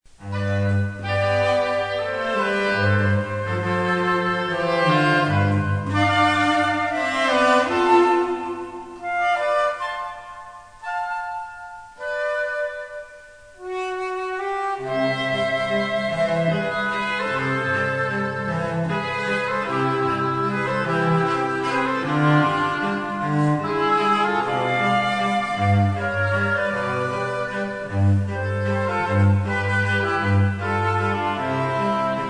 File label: Cello Trio